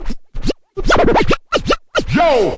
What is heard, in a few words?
turntable yo, Scratching and Turntablism; DRUM LOOPS and BREAKS